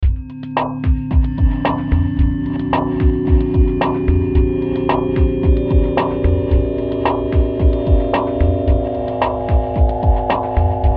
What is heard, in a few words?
The Unity Tone Stereo